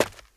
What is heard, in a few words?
step dirt